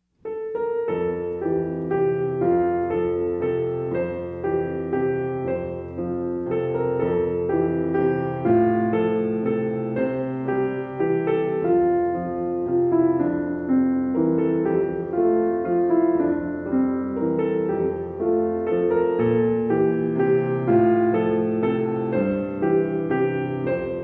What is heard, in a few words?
german folk song